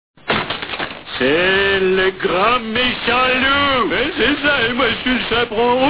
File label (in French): loup